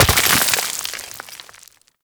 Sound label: Mining Laser Rock Breach Small; Weapons; Warframe; Eidolon Mining Lasers